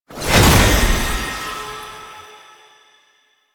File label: Companion Resurrect; Warframe; Tenno